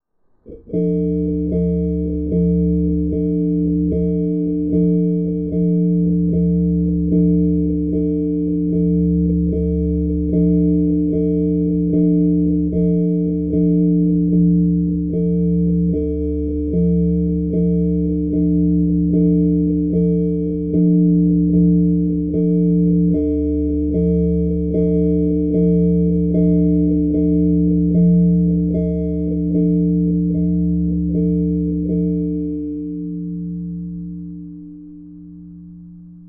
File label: phone